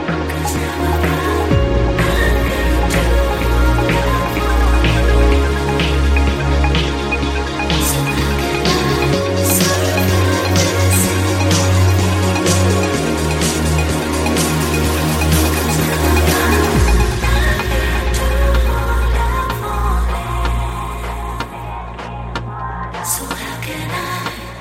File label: Trance Ringtone